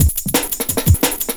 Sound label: 175, Breaks; EZRollers